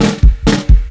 FILL DRUM; USB Soundscan vol.27; FILLACOUS

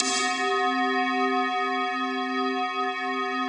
DARK BELL; Pro-Rec - Synthsonic; JV FILM

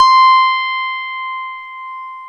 PNO FM, Piano Layer

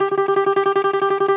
DOMRA, Prima Domra, Plucked Strings

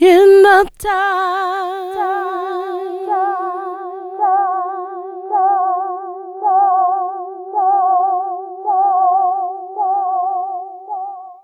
Funky Vocals; USB Soundscan vol.18; AKAI

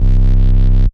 C Bass, DnB One Shots

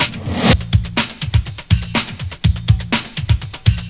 Love Beat loop; Urban Shakedown

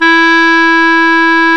Clarinets

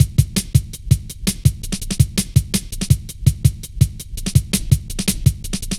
light stepz, drum loops; Zero-G Jungle Warfare 1, 2 & 3

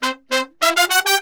BRASS SALSA; World Grooves; Best Service ProSamples vol.14; AKAI

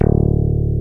BAS; Best Service Gigapack 2

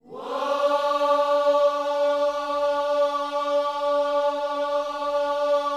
WHOA D 4, Gospel; Spectrasonics Vocal Planet

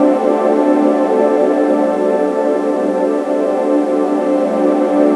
G Flute Riff Cycle Hack; Hip-Hop Street Beats Construction Kit

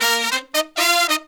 Pop & Funk Brass

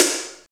SNR, Tekno Industrial; East West

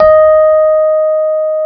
D#4, Electric Pianos; Wizoo

